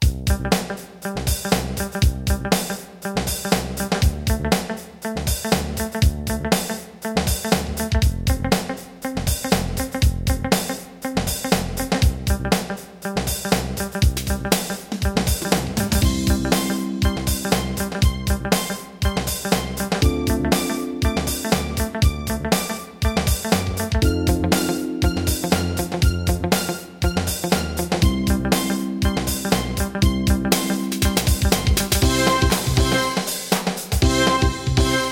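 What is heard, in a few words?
Hip Hop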